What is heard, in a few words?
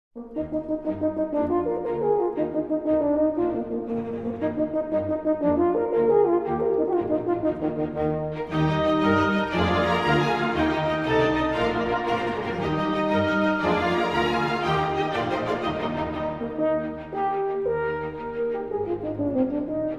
Horn Concerto in E-Flat Major, Rondo. Allegro vivace; Mozart; K. 495